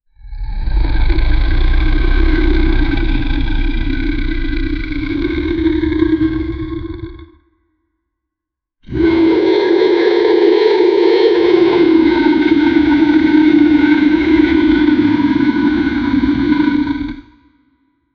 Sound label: wyvern dragon aggressive